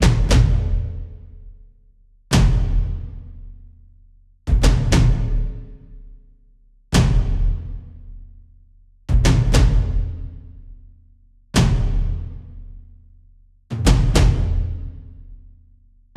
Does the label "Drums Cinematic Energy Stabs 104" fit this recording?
Yes